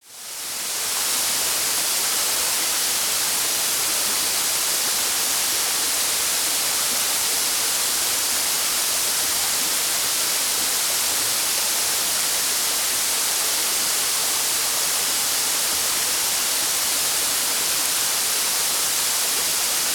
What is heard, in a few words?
Waterfall Not Such A Big Mid Distance At The Bottom